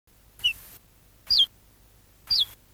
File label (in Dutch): ortolaan, trekroepjes; tijdens de vogeltrek